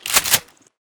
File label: pump, weapons; garrysmod; kimm3